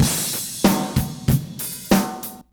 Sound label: donnie, Drum loops Boombap